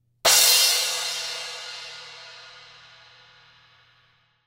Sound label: s16 trash crash, zildjian